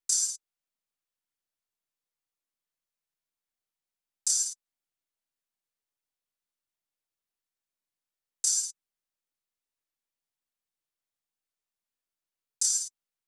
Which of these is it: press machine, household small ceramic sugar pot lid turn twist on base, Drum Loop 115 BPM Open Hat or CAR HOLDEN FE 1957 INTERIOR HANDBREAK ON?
Drum Loop 115 BPM Open Hat